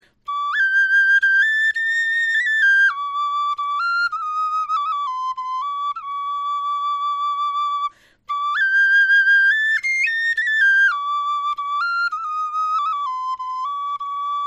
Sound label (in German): melodie, knochenfloeten